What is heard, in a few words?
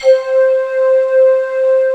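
SQ1 metal, Synthesizers, synbrass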